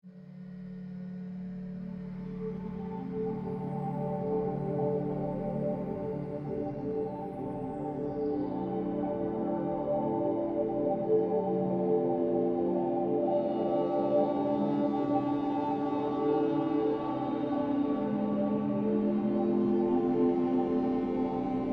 Objective Complete, Music

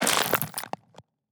Gore; Damage

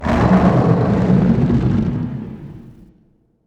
attack; Barotrauma; Endworm

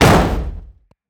Door Break

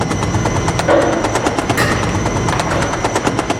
Deconstructor; Fabricators; Barotrauma

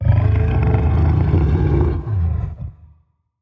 idle, Charybdis; Charybdis old

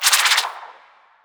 attack Projectile; Spineling

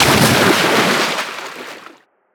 Splash, Water

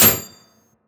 Metal Impact Light

